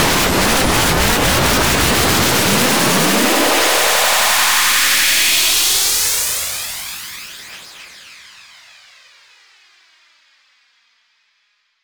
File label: UPLIFTER